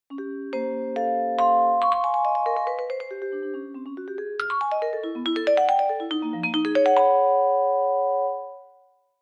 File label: vibraphone; eastwood; sibelius sounds essentials